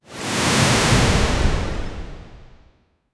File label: whoosh effect air dash, air movement